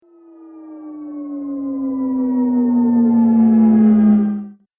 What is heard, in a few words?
Engine Hum Spaceship; Spacecraft Sound Effects